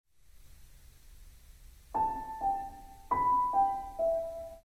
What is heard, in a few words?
Piano Noise